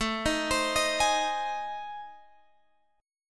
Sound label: A Minor Major 7th Flat 5th, Guitar Open G